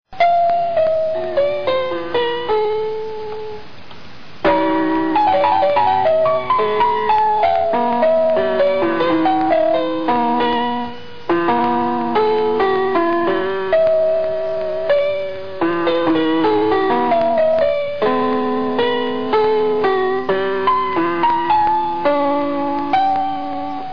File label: piano